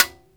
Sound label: TIM, TIMBALES; AKAI S6000 CD-ROM - Volume 5